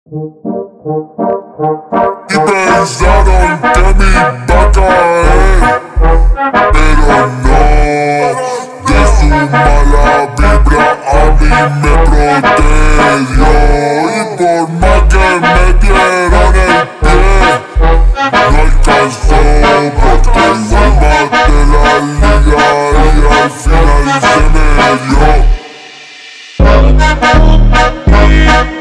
funk do bounce ultra slowed

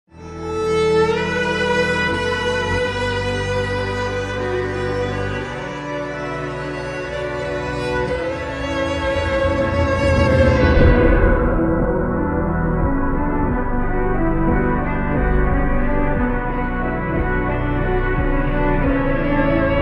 ultra slowed reverb

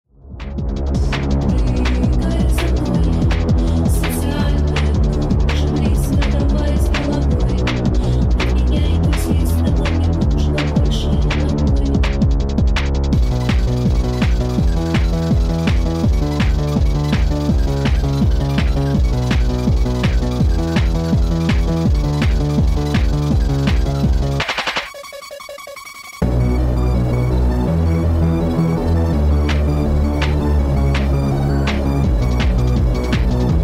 hardstyle remix

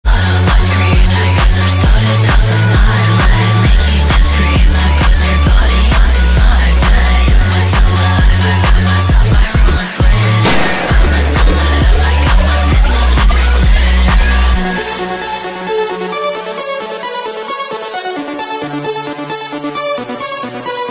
slowed daycore

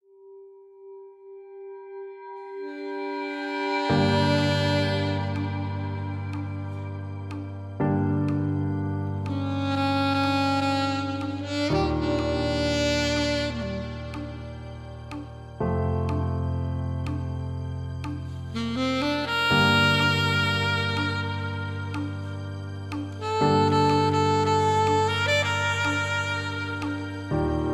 sax impro live

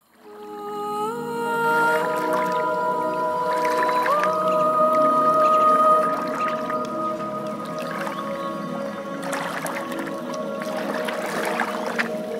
turkish saz rap beat remix turkish trap

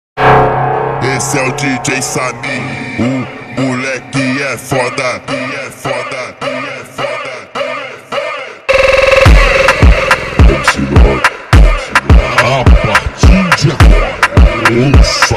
brazilian phonk slowed reverb

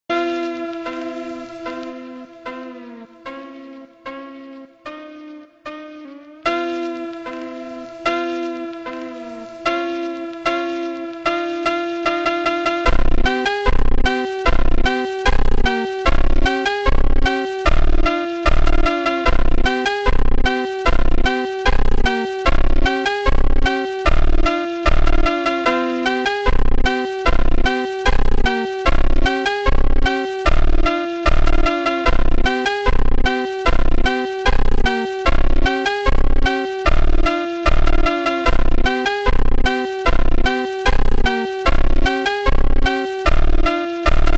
funk super slowed

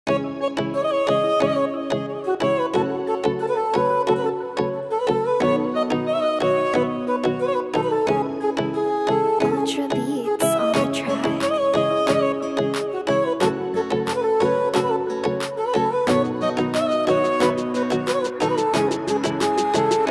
oriental reggaeton type beat instrumental

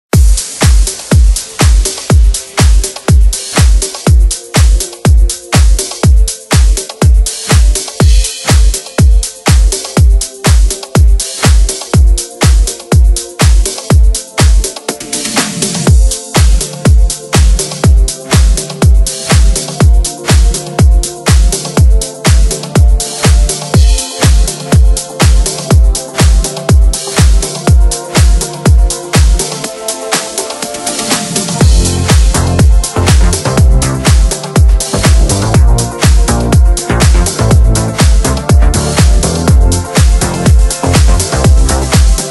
nu disco summer mix

